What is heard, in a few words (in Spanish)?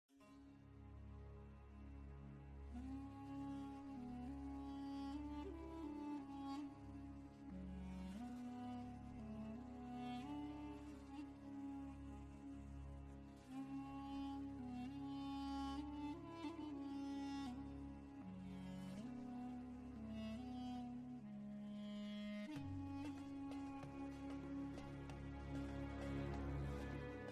MÚSICA DE EGIPTO